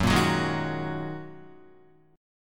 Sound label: Gb 6th Add 9th Gb down Guitar; Listen to Gb6add9 strummed